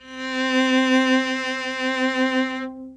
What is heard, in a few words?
viola C4; Pulsar